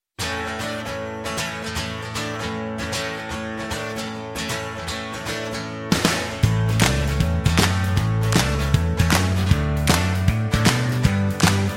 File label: (A) No Backing Vocals